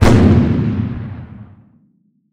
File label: explode; Dystopia